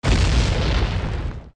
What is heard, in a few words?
bomb blow